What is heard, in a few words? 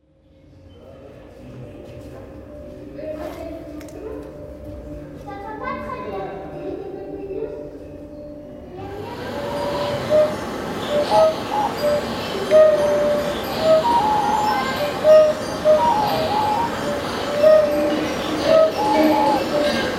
flutes